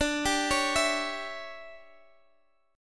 D Major 7th Suspended 2nd Suspended 4th Guitar Half Step Down, chord